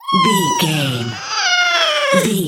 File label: goblin fairy effort push